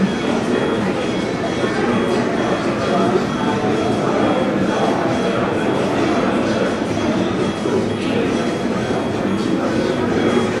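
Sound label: market ambience; best service Blue Box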